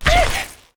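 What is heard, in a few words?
damage, monster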